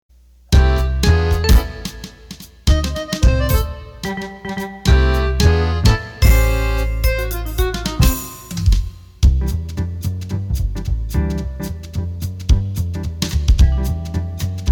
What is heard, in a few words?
Swing Fast